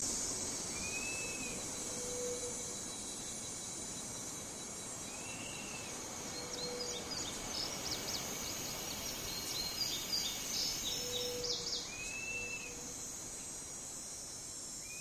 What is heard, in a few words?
ambience brazil; lightning speed